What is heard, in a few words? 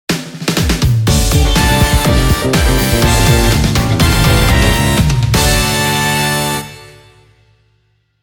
Jingle Quest - Result; sonic frontiers original soundtrack stillness and motion